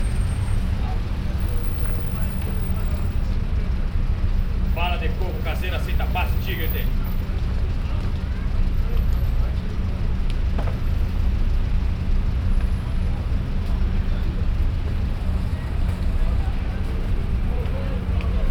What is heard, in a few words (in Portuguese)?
No Onibus, Fieldrecordings, Brasilien